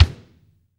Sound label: kick, ambient kick; Rhythm Section